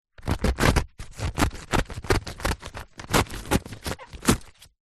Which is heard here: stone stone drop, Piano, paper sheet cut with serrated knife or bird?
paper sheet cut with serrated knife